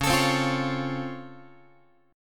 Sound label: C sharp Augmented Major 7th down Guitar, chord; x,9,12,10,9,12; strummed; JGuitar